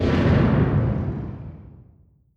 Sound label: distance airplane bomb; Hearts of Iron IV Death or Dishonor